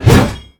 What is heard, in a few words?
whoosh metal